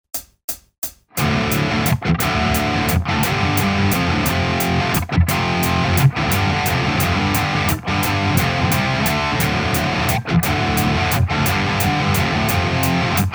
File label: riff